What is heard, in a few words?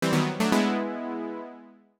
boot